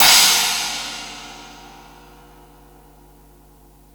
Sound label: CYMB; AMG Gota Yashiki Groove Activator; GOTA CYMBALS